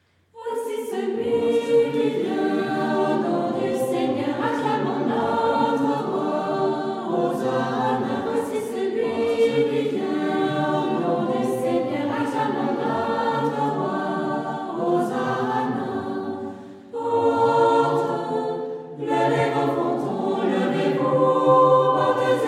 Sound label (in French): Voici celui qui vient couplets 1 et 2